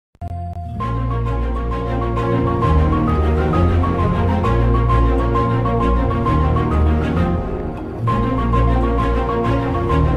bgm; thiruchitrambalam; thiru movie bgm

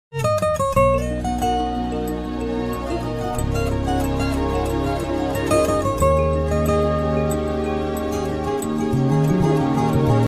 bgm ringtone; jersey

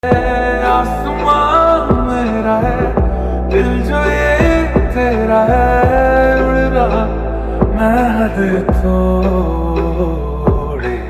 reverb song

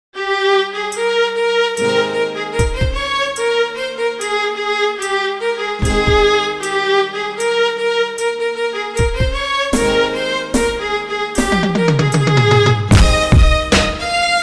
instrumental cover on walk band

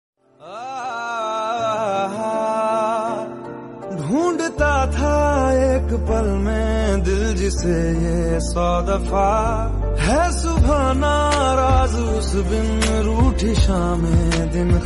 sad song ringtone, hindi ringtone

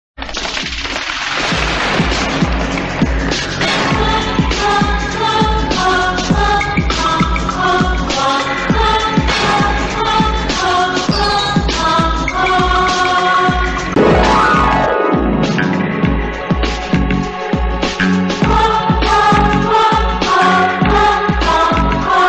background music bgm; vishwatma; amrish puri; sunny deol; chunky panday

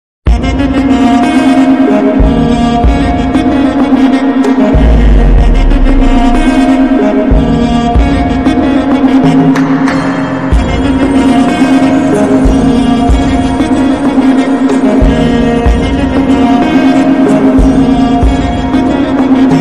beautiful bgm slowed reverb

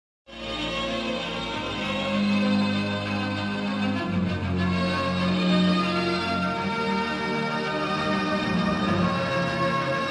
bgm

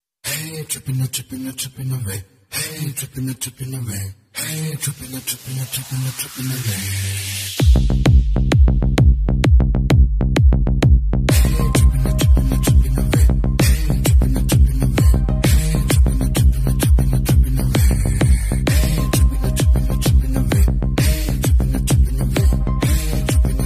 song bgm ringtone; kanguva

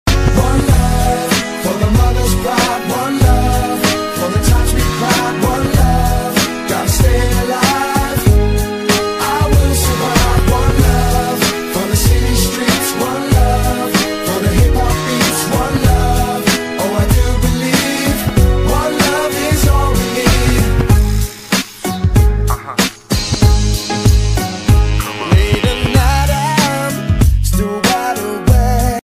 love ringtone

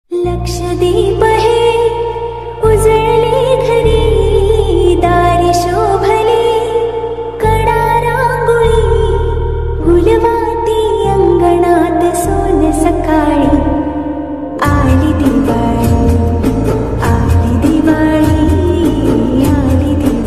marathi ringtone happy diwali

